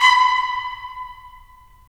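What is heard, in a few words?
brass trumpets staccato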